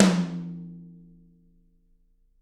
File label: TT, Toms; Bob Clearmountain Drums I